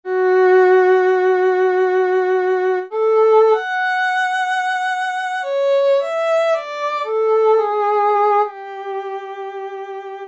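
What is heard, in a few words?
Strings Violin Warm vibrato; Roland L-CDX-04 Strings And Orchestral Percussion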